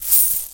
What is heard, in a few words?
give coin; Golden Crayon Sounds